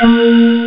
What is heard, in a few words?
claves; Amiga Soundtracker Sample Packs